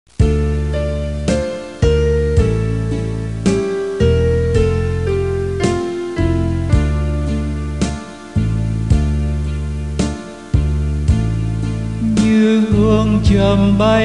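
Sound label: soprano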